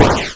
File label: explosion; Station-0